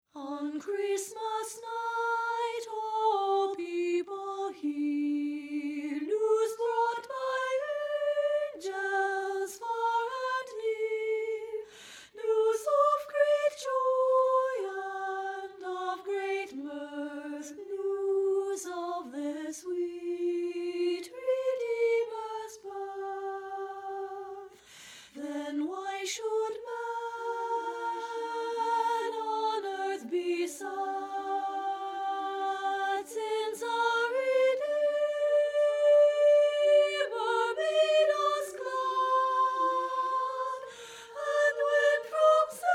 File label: ON CHRISTMAS NIGHT SOP